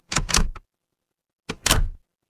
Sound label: door lock